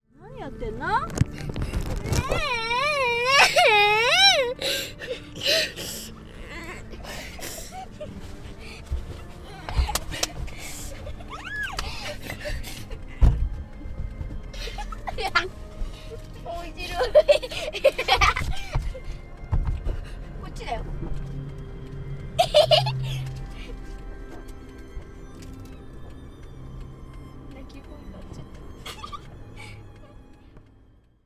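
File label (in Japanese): kodomo no nakigoe waraigoe, child crying and laughing voices; Chino Cultural Complex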